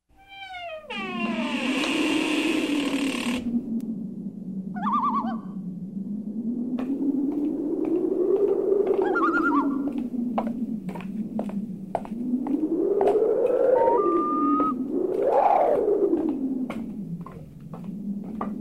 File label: Night Wind; SONIDOS TERROR